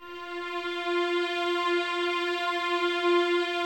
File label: F 4, 11 Violins, Soft; Miroslav Vitous